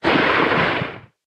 stomper idle, enemies; Factorio

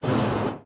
recycler jaw move; Factorio

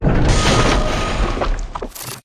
space platform tile build, factorio sound terrain

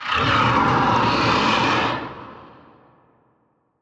demolisher roar, enemies; Factorio

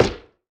axe mining tree; yumako; Factorio

